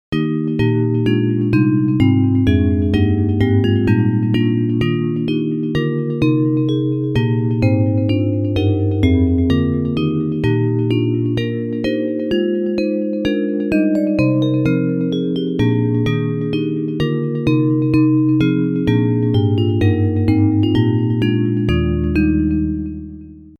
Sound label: Bells